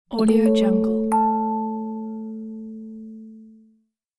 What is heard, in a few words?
kalimba message notification; نوتیفیکیشن پیام با سا; interface sounds